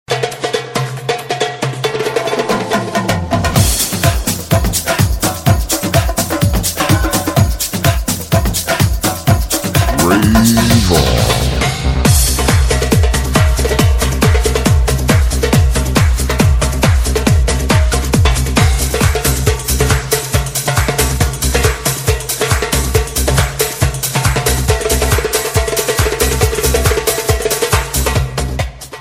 latin drum mix ringtone

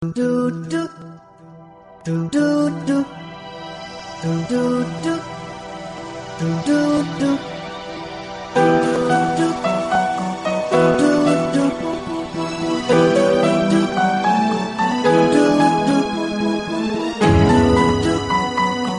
patriotic ringtone; docomo; english ringtones

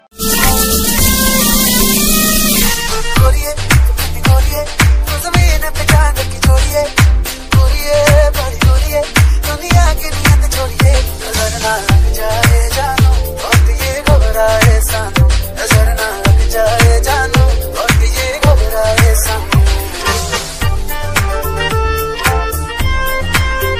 dj bollywood music ringtone

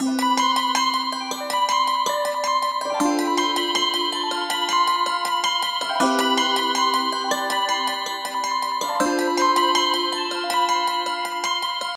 new techno mobile ringtone

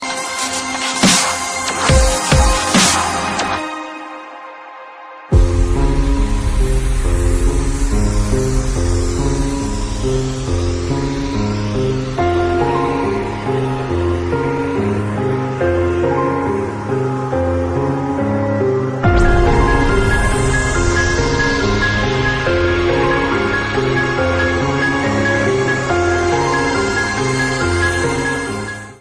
piano tune ringtone

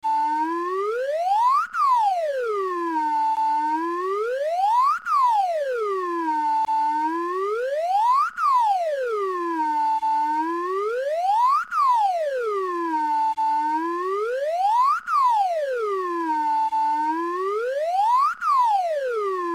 slide whistle extend

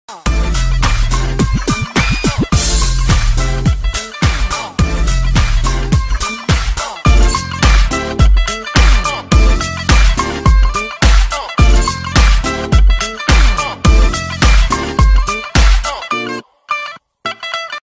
bollywood music ringtone

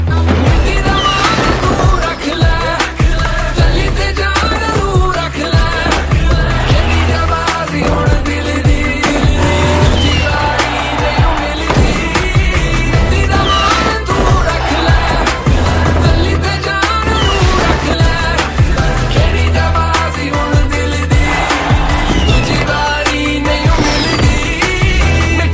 cricket song tone ringtone

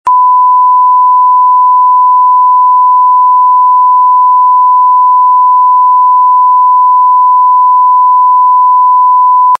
1khz ringtone; galaxy ringtones

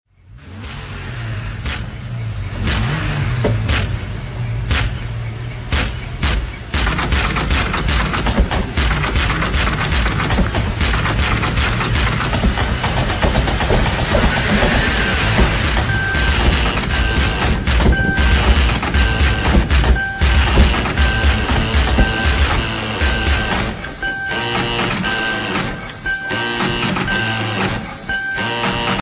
techno ringtone

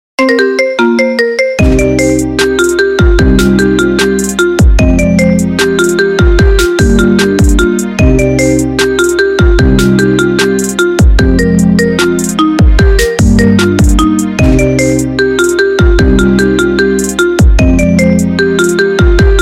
sad marimba ringtone

